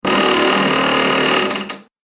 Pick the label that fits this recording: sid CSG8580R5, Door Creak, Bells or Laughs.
Door Creak